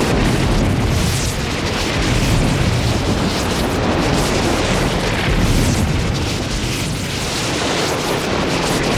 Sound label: thunder claws; Volibear